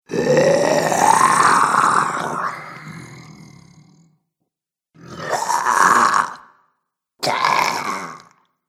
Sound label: Zombies Selection, Zomby Sound Effects; horror